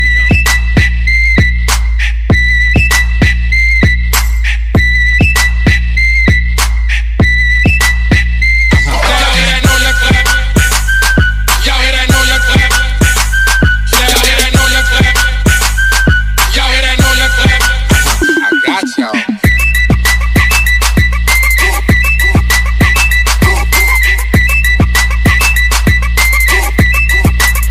english tone ringtone

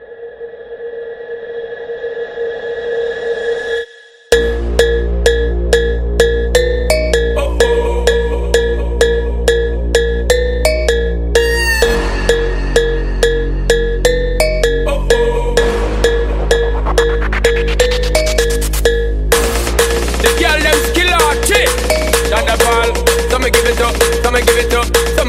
car bass music ringtone

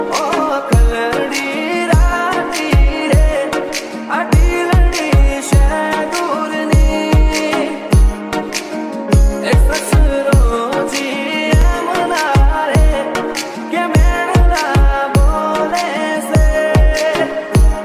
gujarati ringtone

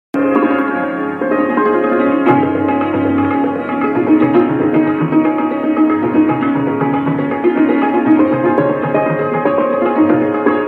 tabla fast ringtone

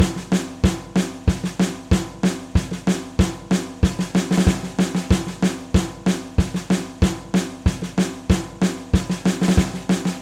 drum beating mobile phone tone ringtone

